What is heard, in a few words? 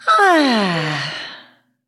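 Female Expressive Sigh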